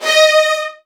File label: Virtuoso Strings, D#2; ILIO; Kirk Hunter Virtuoso Strings VOL-1